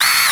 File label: science fiction robot movement